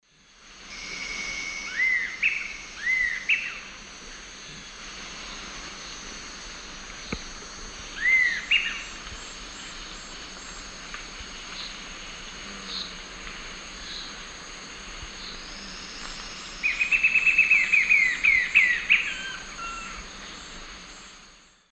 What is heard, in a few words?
Sirystes sibilator, song and call; S. America; Eastern Lowlands